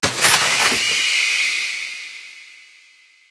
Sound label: Caged Creature Fail Escape